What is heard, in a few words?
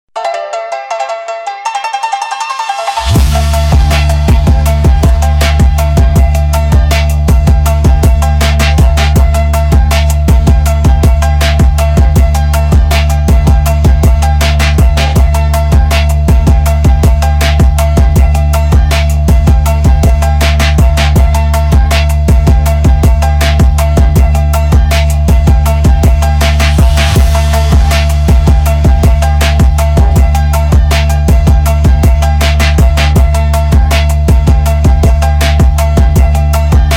brazilian phonk, killer cowbell